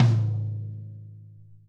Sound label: Raw L Tom, Alesis SR-18